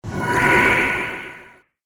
Wizard Magic Gust Wave; fiction sound effects

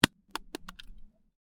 Apple lands on concrete and bounces slightly; Miscellaneous Impact

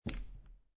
Poker Chips Place Stack Of Chips Gently On Felt

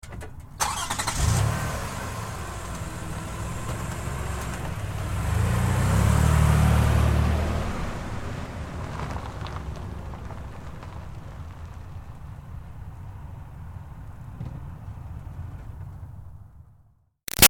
Car Highlander Start Away Short Stop; 2005 Toyota Highlander V6; Cars; Vehicles; Big Room Sound

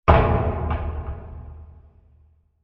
Large Wood Impact With Dropping Elements; Blasts; Impacts; Crafted Signals

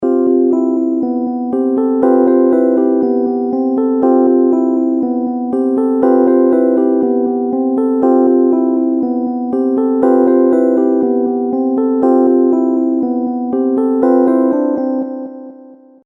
Rhodes Piano; Musical Sound Effects; music loop; Reflections - Rhodes Piano

